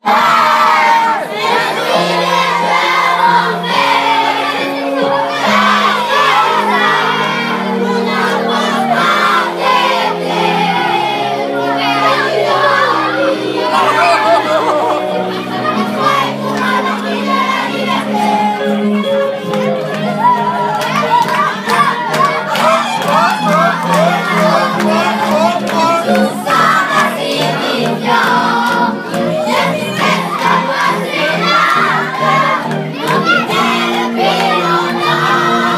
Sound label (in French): Boum; Classe de decouverte